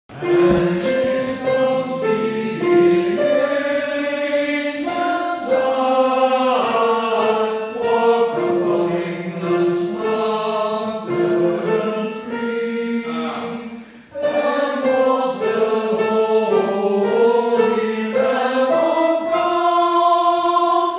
Jerusalem Tenor

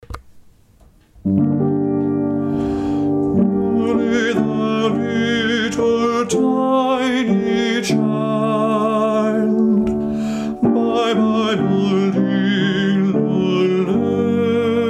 Coventry Carol tenor

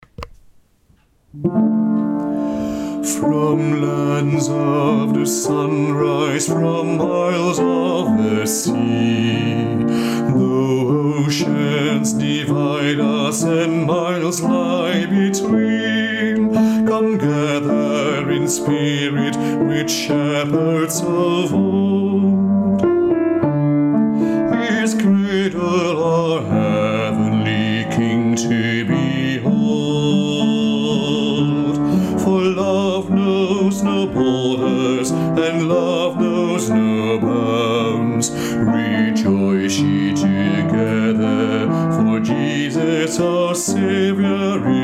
bas

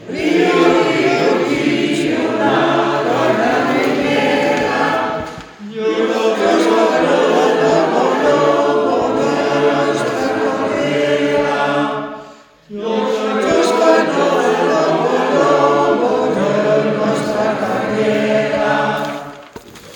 Riu Riu Chiu Tutti

